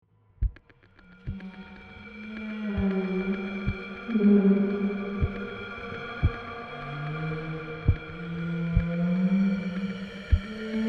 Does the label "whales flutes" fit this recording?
Yes